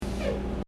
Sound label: bus